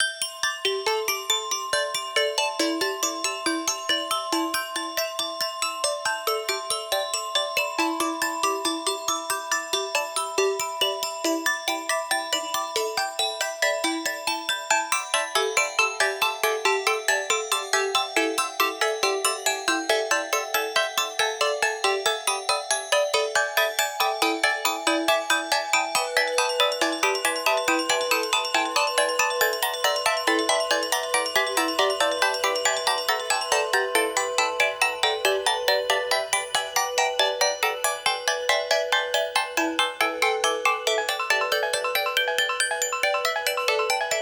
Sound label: UI Music, synthesizers, a playful, but curious melody; I played with the parameters of synthesizers to create a playful, but curious melody.; Monument Valley Game